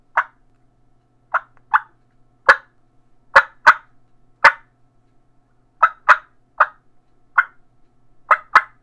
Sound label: batwing IV clucks, turkey; Southland Sounds